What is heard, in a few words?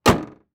Transportation Jeep Commander Close Hood